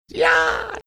making version of wilhelm scream

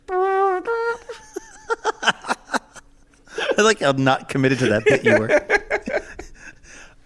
taps mouth horn

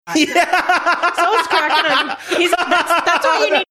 having a good laugh

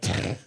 snorting laugh

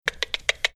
making tongue clicking sounds

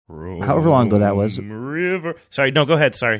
singing chrome river saying sorry go ahead

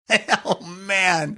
chuckling saying oh man

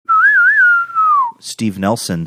whistling saying

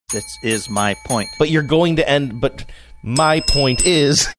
saying this is my point dinging bells emphasis

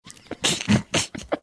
giggling snickering

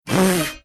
spit take sound

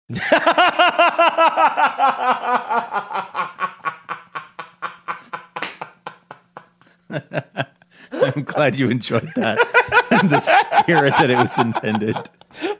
laughing after clip saying idiot

